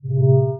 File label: Science Fiction Sci-Fi Electronic Holographic Projection On